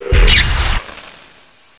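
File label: teleport; CHASM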